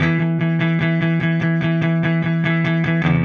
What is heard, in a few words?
Silos Clean Strum Guitar, Indie Rock